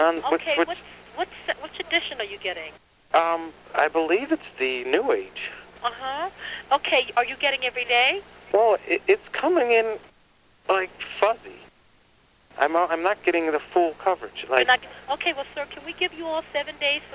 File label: Call